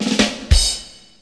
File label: Rimshot